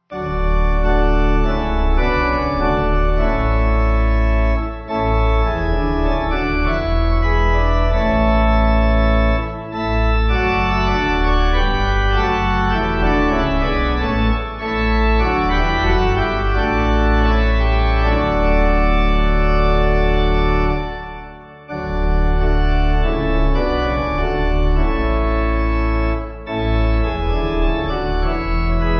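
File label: Pipe Organ, Music Backing Files for Churches; Stand Up And Bless; Watchman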